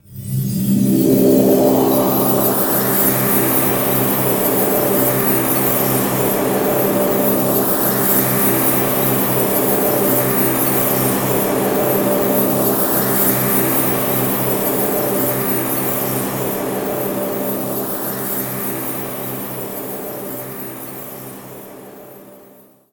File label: Amb; doko demo issho toro to nagare boshi; ps2